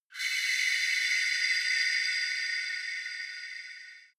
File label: shot shining mysterious lights, swooshes swishes